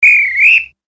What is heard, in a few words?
Game Over; Sony Ericsson W950